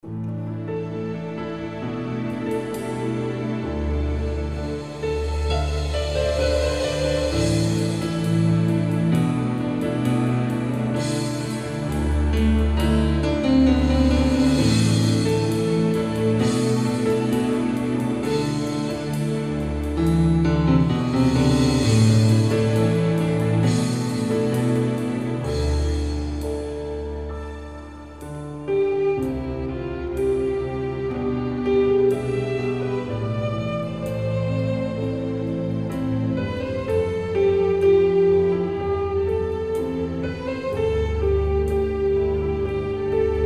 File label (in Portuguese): Piano e Cordas